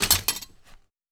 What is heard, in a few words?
Glass